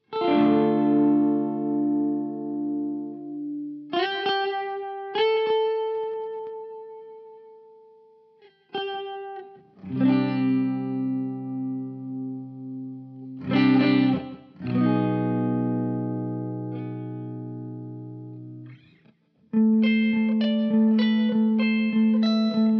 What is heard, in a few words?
plate; KONG Touch My Hall; tb audio